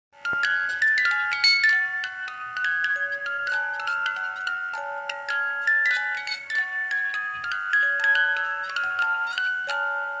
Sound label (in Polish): pozytywka, melodia z pozytywki